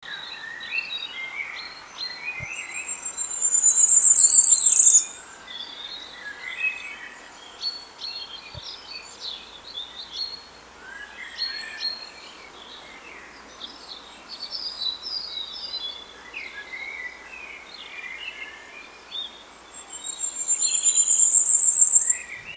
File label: firecrest Regulus ignicapilla; Stuart Fisher